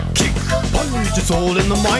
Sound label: kickpunch; psx; parappa